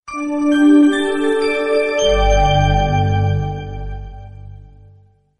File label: startup sms